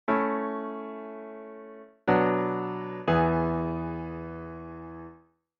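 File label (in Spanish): Semicadencia Ii a V, A; auditivo de cadencias autenticas plagales rotas y semicadencias; Identificar la cadencia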